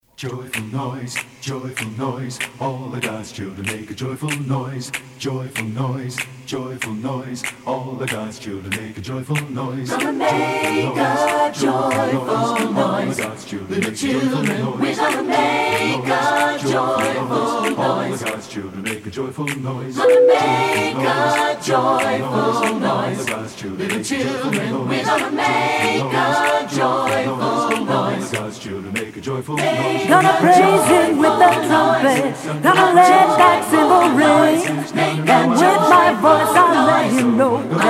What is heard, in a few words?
a cappella; joyful noise; hlpc 1991